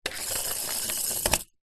food drink sweetener tablets plastic pot roll on table